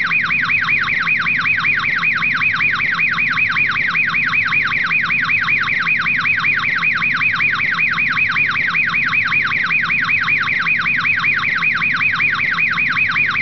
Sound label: car alarm